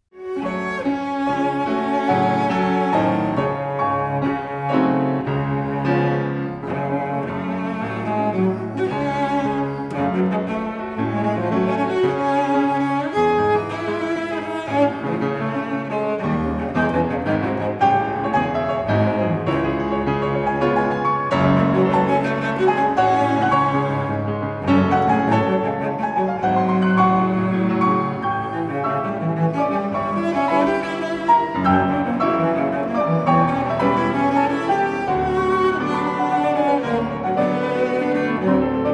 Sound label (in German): Cellosonate